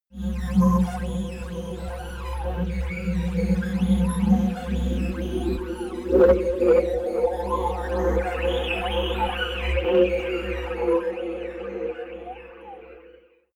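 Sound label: alien scout, science fiction; atmosphaeren umwelt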